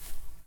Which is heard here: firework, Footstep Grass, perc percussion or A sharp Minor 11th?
Footstep Grass